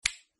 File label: snap